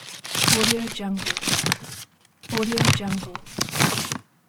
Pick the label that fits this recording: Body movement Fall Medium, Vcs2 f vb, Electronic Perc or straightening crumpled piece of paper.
straightening crumpled piece of paper